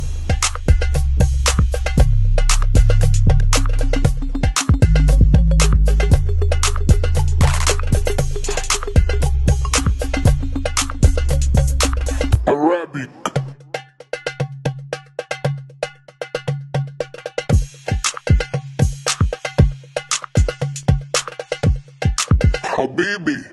new arabic english ringtone

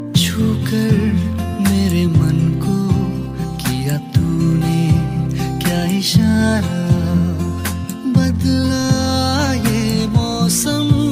love sad ringtone; bollywood ringtones

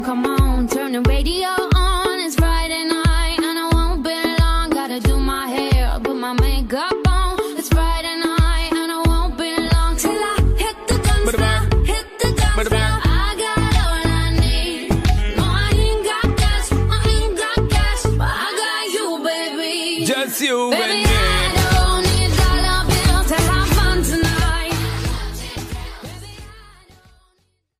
english song ringtone

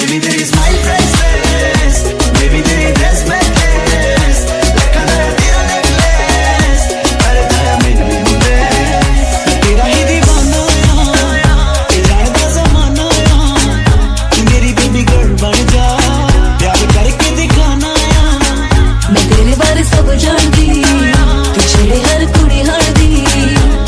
cartoon ringtone

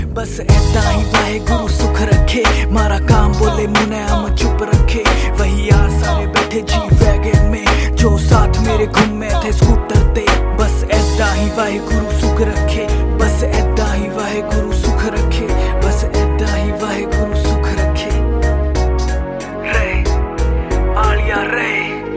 punjabi ringtone

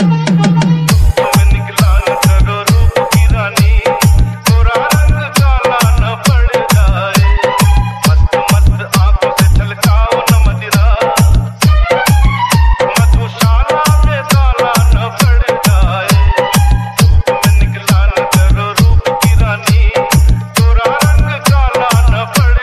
music ringtone, bollywood

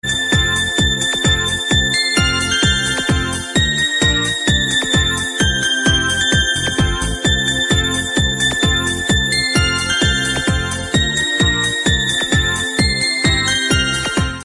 christmas bell ringtone

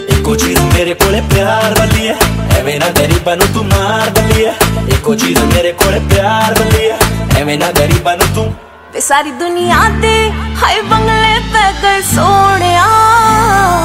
punjabi music ringtone

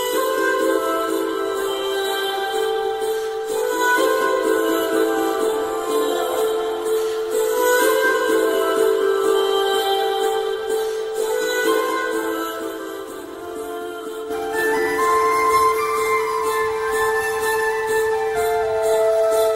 sweet sad music ringtone

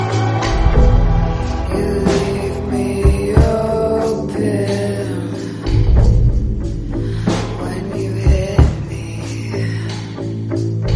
sad song tone ringtone

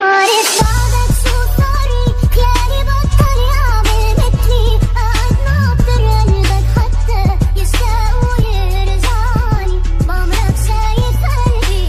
arabic ringtone

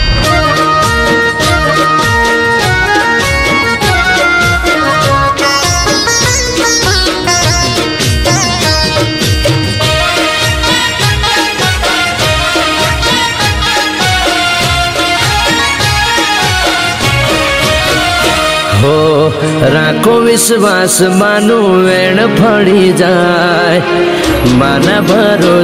gujarati ringtone

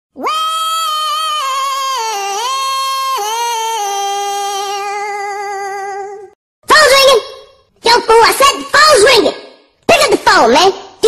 funny musical tone ringtone

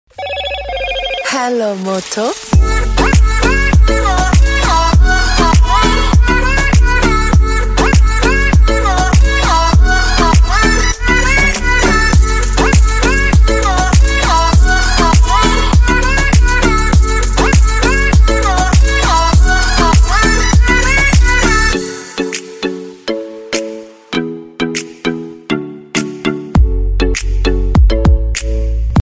moto e ringtone; moto e 2022

